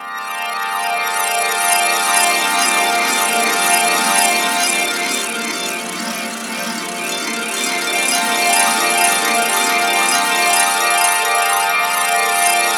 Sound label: INVERT GLIS; Spectrasonic Distorted Reality 1